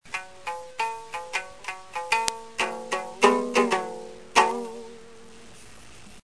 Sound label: guit